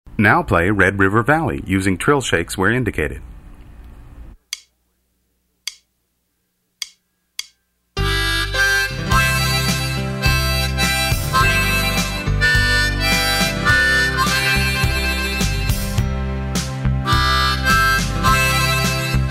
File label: red river, harmonica